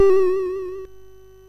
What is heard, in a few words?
sid, MOS6581R2; Donkey Kong 1983